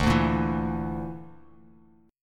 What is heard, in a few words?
D Suspended 2nd Sharp 5th D, Bass Standard; Dsus2#5; strummed; JGuitar